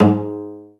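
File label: Cello Pizzicato G#2; Digital Sound Factory Virtuoso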